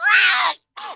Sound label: Air Bail Female